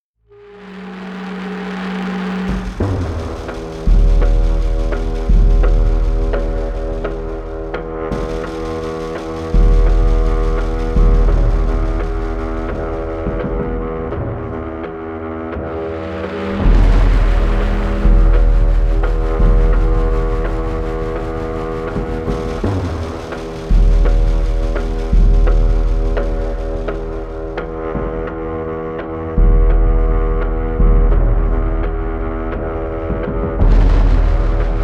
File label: false alarm instrumental